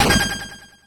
grab; Undertale

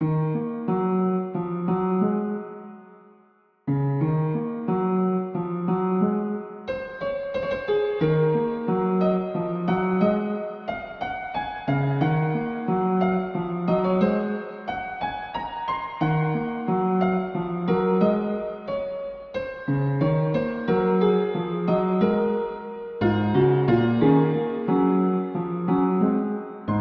ruins piano; Undertale

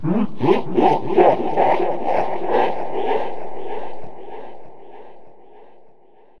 new laugh low; Undertale

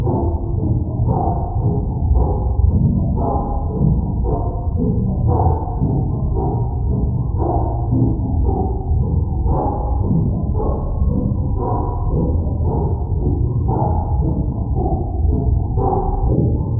disturbing; Undertale

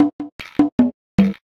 mushroom dance; Undertale

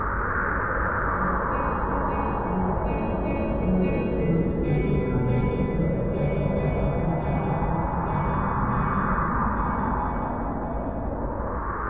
core transition; Undertale

